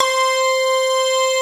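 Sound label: DIGITAL CHASE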